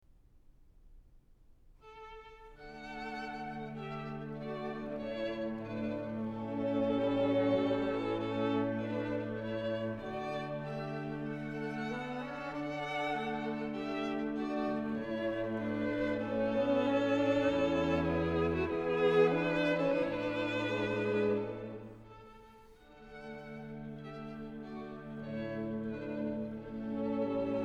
String Quartet No. 5 in A Major, Andante cantabile; Ludwig van Beethoven; Beethoven - String Quartets No. 1-6, Op. 18